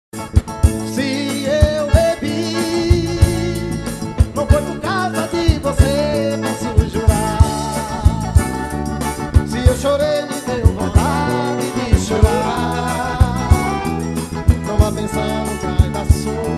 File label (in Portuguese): acordeon